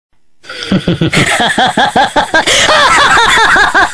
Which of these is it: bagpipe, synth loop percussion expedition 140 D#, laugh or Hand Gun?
laugh